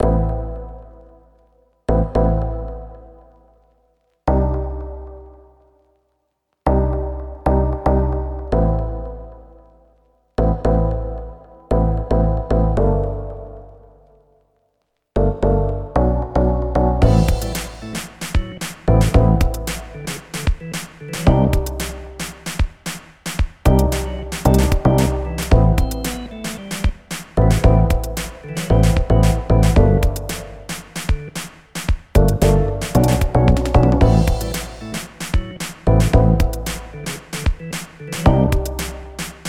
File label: Cool BGM modern and stylish music